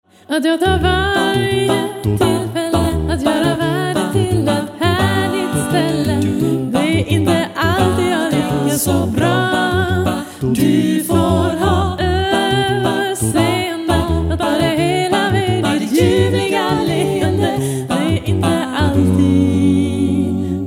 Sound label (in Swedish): musik